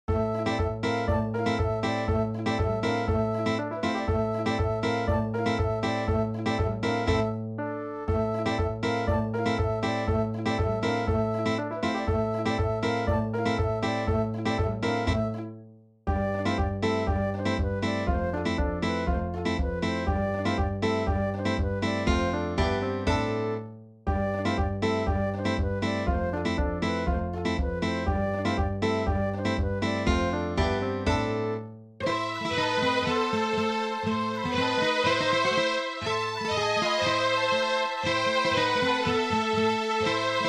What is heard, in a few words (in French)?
bourree